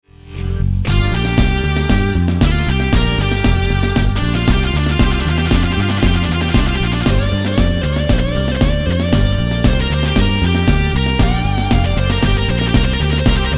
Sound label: VO Drive on dist guitar